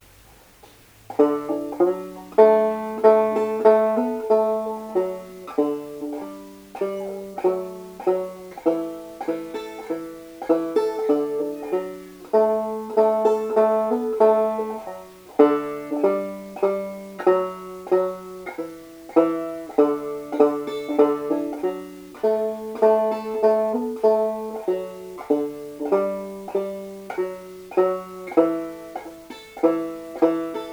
whiskey before breakfast, banjo

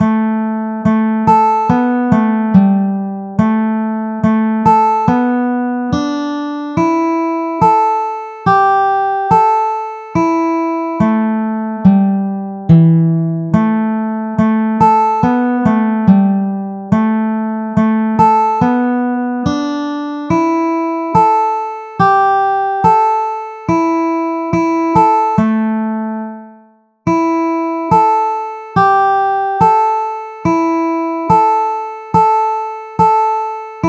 betsy likens, banjo

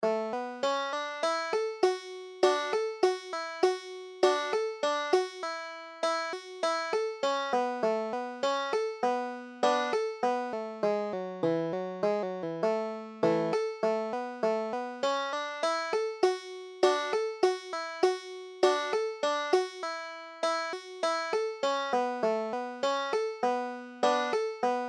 jolie blond, banjo

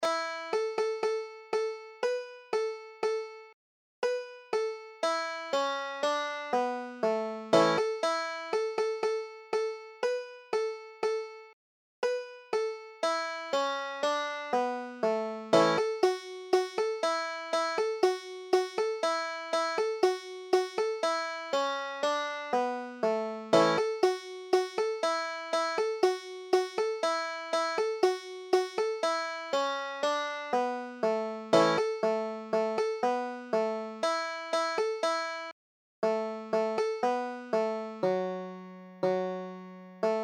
boatman, banjo

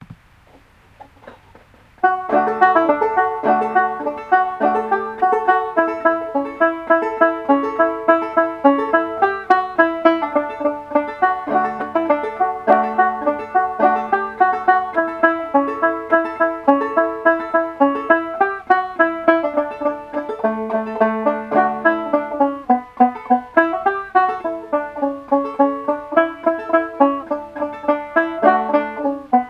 golden slippers D, banjo

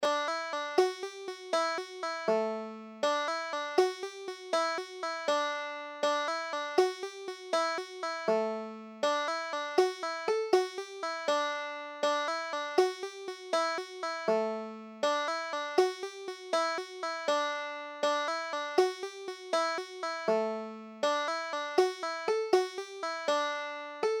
jig, banjo

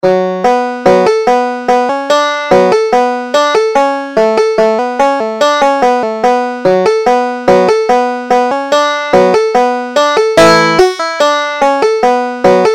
finnish polka, banjo

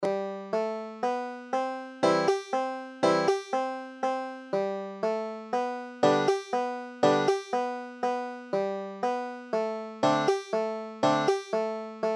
banjo

